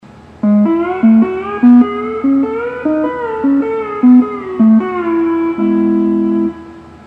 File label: hawaiian piece